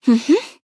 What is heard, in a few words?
Nonverbal Yes; Aurora